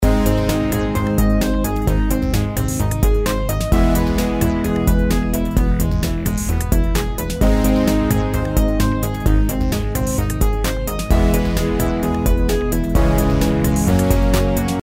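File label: robots outro background music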